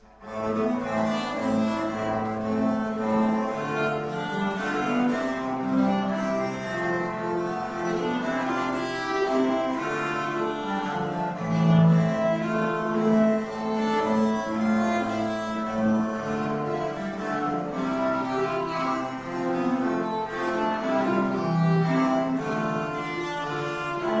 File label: trio